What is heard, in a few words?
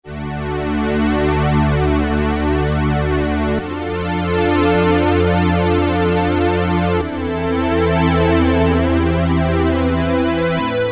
Low String, Roland MKS-50; Factory examples